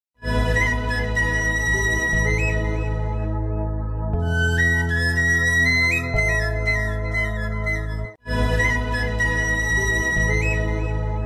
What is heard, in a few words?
mobile flute ringtone marathi ringtone; kaveri; bhagya dile tu mala